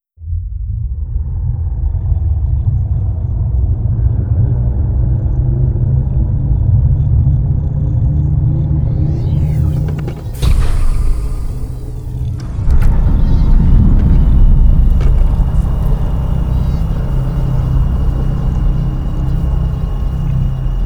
molluscoid colossus opening; apocalypse

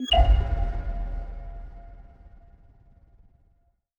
notification subtrait; Stellaris; paragon

